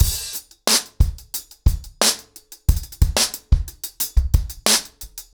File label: ACOUSTIC DRUMS, 90 BPM